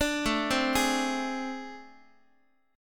A Minor Major 7th Double Flat 5th, Guitar; AmM7bb5 arpeggiated; x,x,5,7,4,7; 4A,4E,5A,5D,5G#,6C#

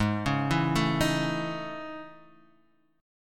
A Major Sharp 11th, G sharp

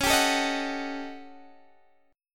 Eb 7th Sharp 9th Flat 5th, Db

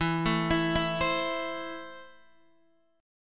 E Suspended 4th Sharp 5th E, Guitar, Open D; down

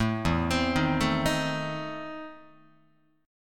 E Major 7th Suspended 4th Sharp 5th, Guitar

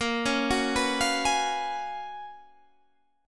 Gb Major 11th

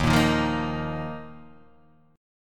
D sharp Suspended 2nd

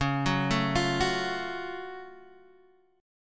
F sharp Minor Major 7th; chordshape; 9,9,7,10,7,x

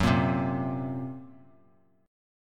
F sharp Major Flat 5th, Bass Standard; strummed; x,9,8,5

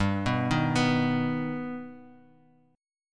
G Augmented; G+ arpeggiated; 15,14,13,16; JGuitar

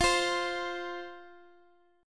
F 5th F Guitar Standard; strummed; chord; x,x,x,x,6,8; JGuitar

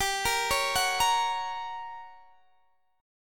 G Minor Major 7th Flat 5th G, Guitar, Standard; arpeggiated; x,22,20,18,19,18

